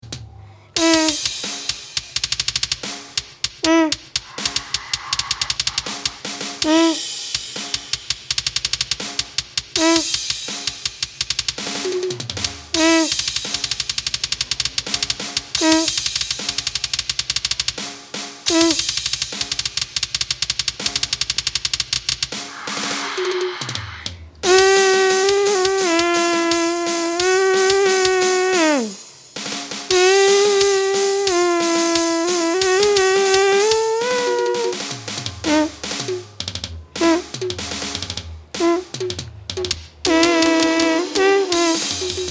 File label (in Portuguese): instrumental rock ao vivo